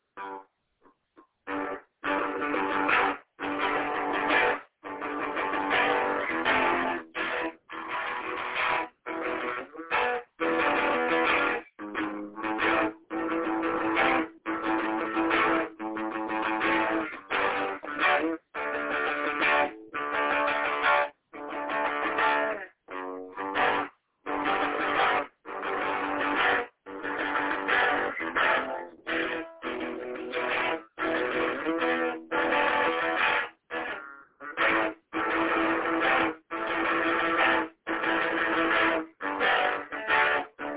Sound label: dramatic rock processional